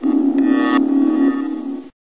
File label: air turret active loop; hl2